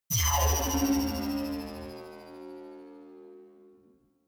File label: energy field vanish